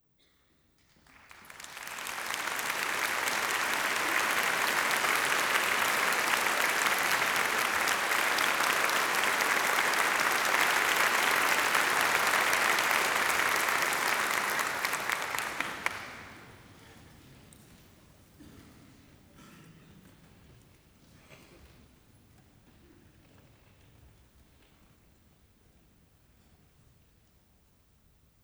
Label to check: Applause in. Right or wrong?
Right